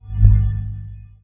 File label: trident glow; smite; poseidon